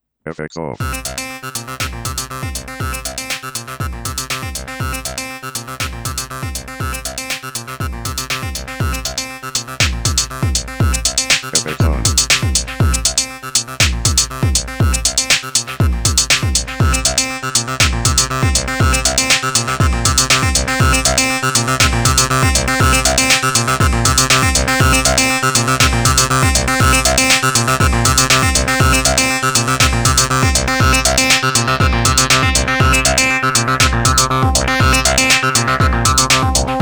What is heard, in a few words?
drum bass; ableton live